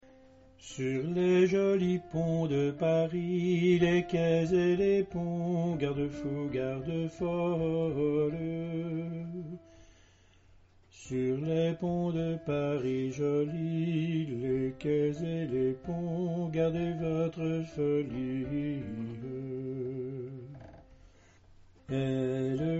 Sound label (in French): sur les jolis ponts de paris alto